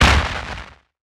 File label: asteroid damage large; Factorio